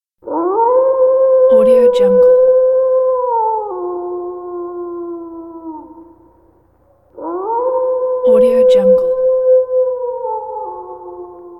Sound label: wolf with reverb; cubestudiocg